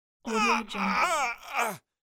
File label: male yell